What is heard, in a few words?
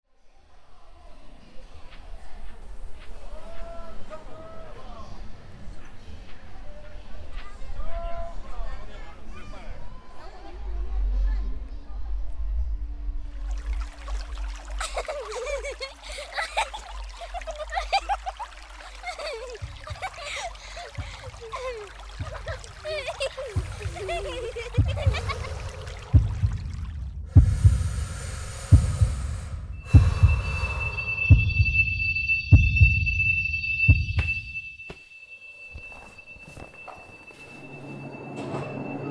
Memory Soundscape